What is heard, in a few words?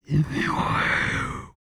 VOX FX, BREATH FX